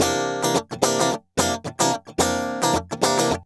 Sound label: GUI, 110 BPM